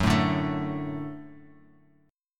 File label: Gb Minor Sharp 5th, down; Gbm#5; strummed; JGuitar